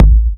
Soft Kicks; Vengeance Samples

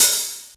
Cymbals OH, Open HH; Vengeance Samples

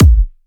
126BPM Kick; Vengeance Samples; Thommy Kit

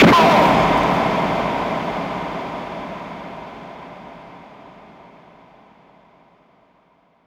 Hits & Explode

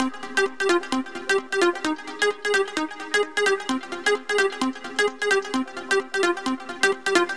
130BPM Melody; Vengeance Samples; Comboocha Kit

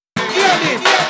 Fill 128 BPM; Vengeance Samples; VEH1 Loops

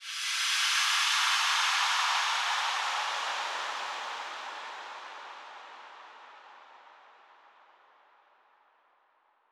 Sweep FX 140BPM; G minor; Sound FX; Vengeance Samples

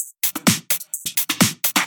House loops 128BPM; Vengeance Samples

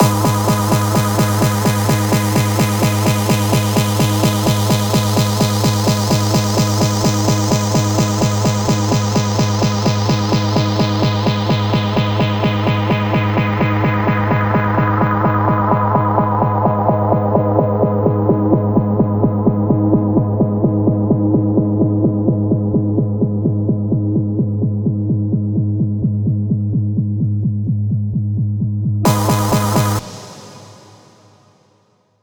128BPM Chordstabs G#, Melody Fills; 16Bars; Vengeance Samples

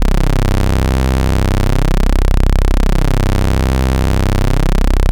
Ultimate Bass Root C; Vengeance Samples

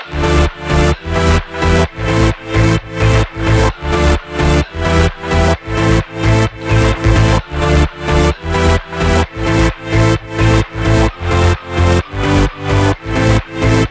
Root A 130 BPM; Vengeance Samples; VEH3 Special Sounds

